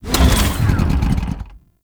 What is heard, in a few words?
Dismount, Motorcycle; Warframe; Vehicles; Motorcycle Ninety Nine